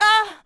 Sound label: Hit